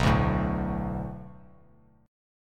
B Minor 7th, Bass Standard; Bm7 strummed; JGuitar

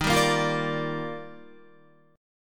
Eb Major 9th, Guitar; EbM9 strummed; JGuitar

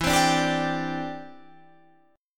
E Minor 7th Sharp 5th E, Guitar Half Step Down

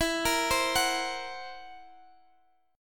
Gb 7th Flat 5th E

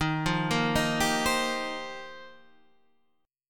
F 9th Suspended 4th Eb, chord

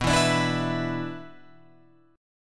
B Minor Double Flat 5th B Guitar Open F, chord; Bmbb5 strummed; JGuitar

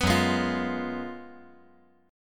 F sharp Major 7th Suspended 2nd Suspended 4th; strummed; JGuitar

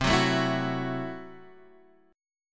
C 6th Flat 5th, C, down, Guitar, Standard; strummed; chord; JGuitar

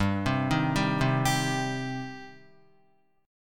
G Suspended 4th Sharp 5th G down Guitar; arpeggiated

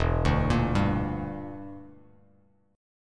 E Minor 7th Flat 5th E down Bass Standard; arpeggiated

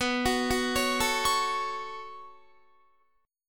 B 7th Suspended 2nd, Guitar; B7sus2; arpeggiated

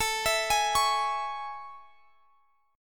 A Major 7th; AM7 arpeggiated; x,x,19,21,21,21

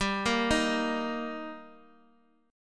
G Minor Sharp 5th G Guitar Open D, chord

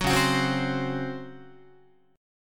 C sharp Major 7th Suspended 4th C sharp Guitar; C#M7sus4 strummed; JGuitar

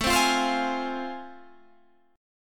A Minor Major 7th Sharp 5th A, down

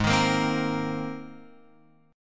G sharp Major Add 9th, Guitar Open F; G#add9 strummed; JGuitar

